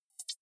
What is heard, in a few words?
hover high, signale